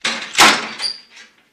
Metal Gate Close

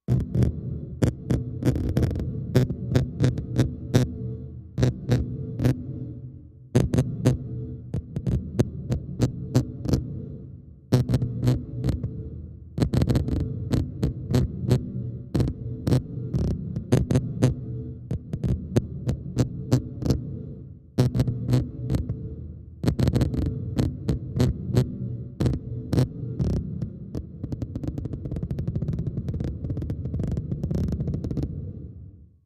Robot Speak Machine, communications

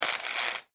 Arc Welder

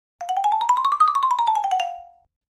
Xylophone Fst Up Down, mallets xylophone

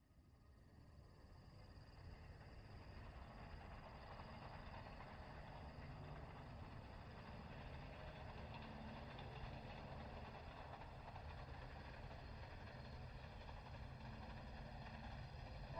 Train By Med Speed Med, bys

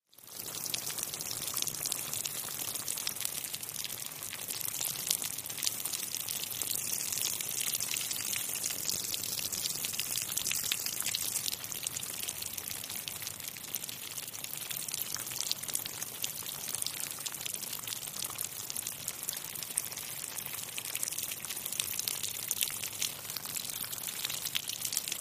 Rain Fall On Mud

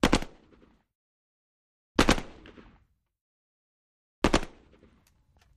AK47 Double Taps Ster

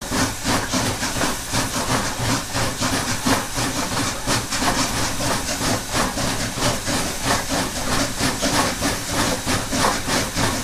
Steam Train Chugging

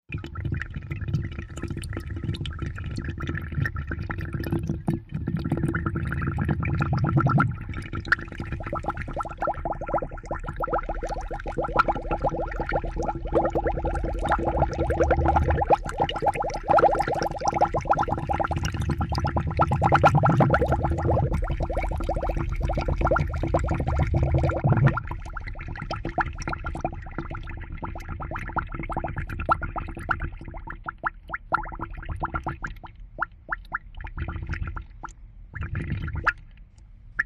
Hvy Fst Bubbling; bubbles

